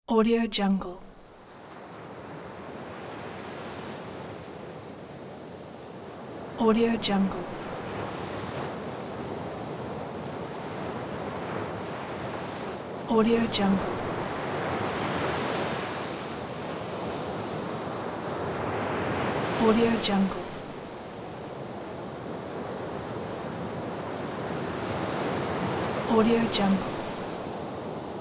distant ocean waves

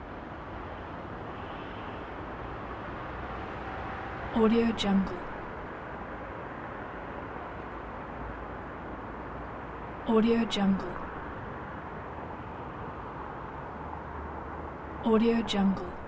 ambiance traffic steady night loop; blazznet

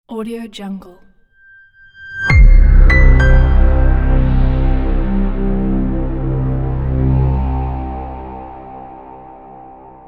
deep corporate logo intro

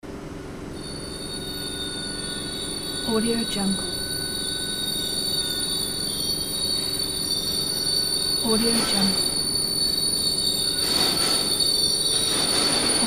industrial high noise machine

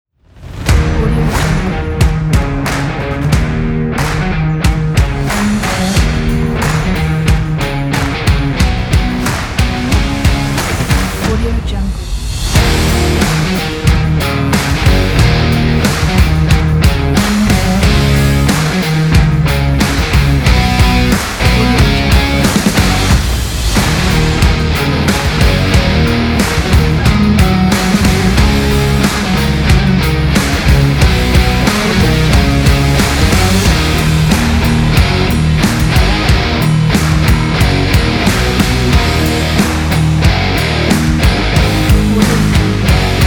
epic rock

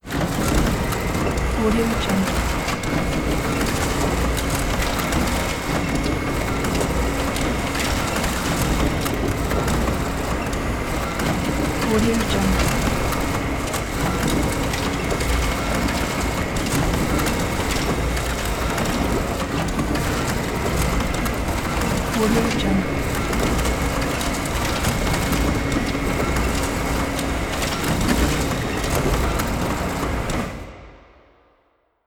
ambience machine factory wet

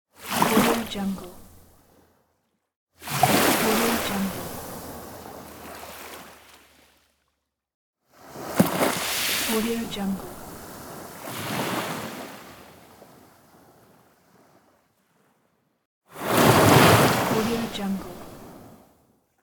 wave

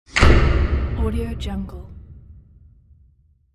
stadium spotlight

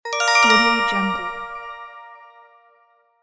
sparkle swell

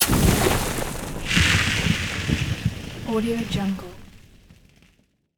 1st person dirt debris; matter mayhem; soundmorph

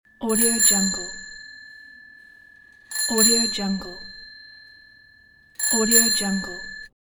bicycle bell

